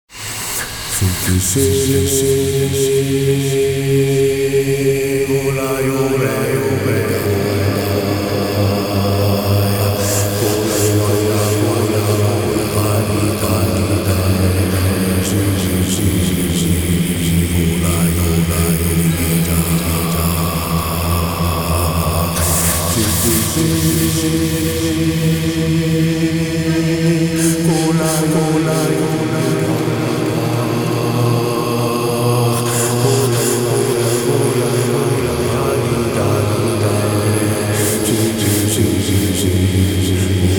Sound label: chant